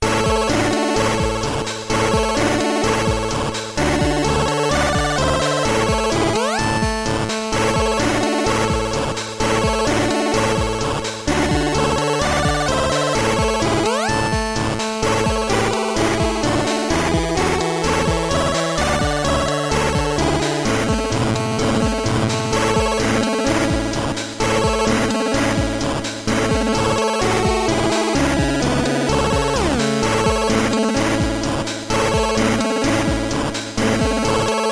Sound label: happy chords